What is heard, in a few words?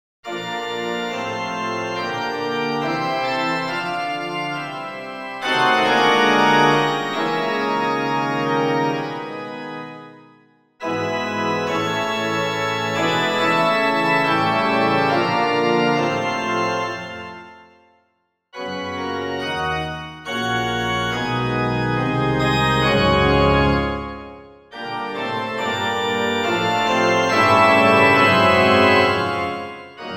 Recitative in Reger style (organ)